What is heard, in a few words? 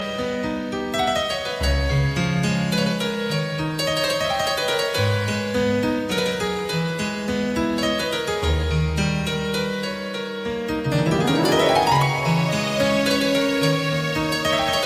piano azeri